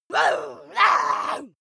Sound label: witch shout bark